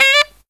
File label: SAX, Killer Brass Riffs